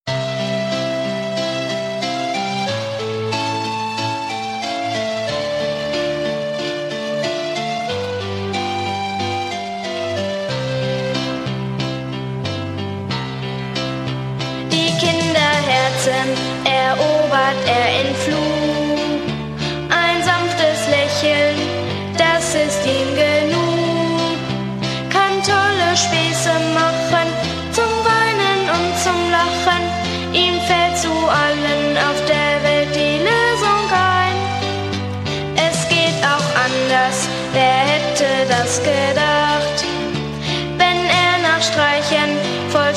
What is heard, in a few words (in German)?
zirkuslieder